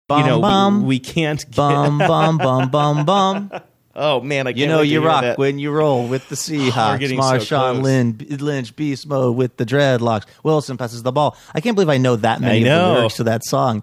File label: sings; beast mode